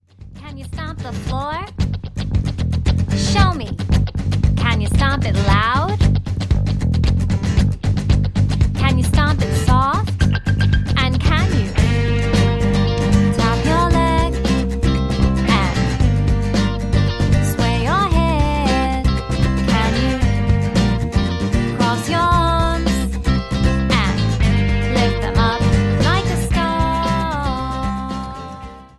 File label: Stomp the Floor